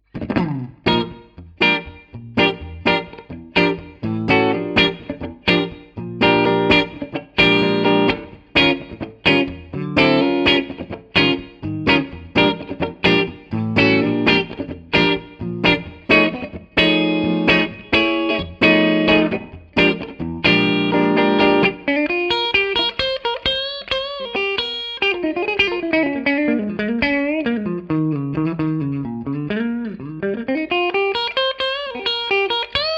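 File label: classic clean neck bridge, humbucker; schwarzbach; HB59-Classic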